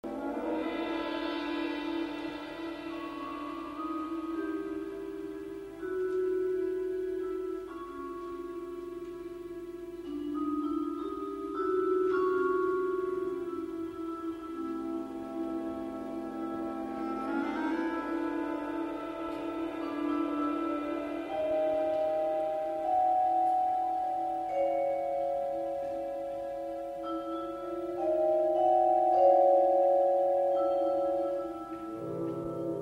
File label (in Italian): recitativo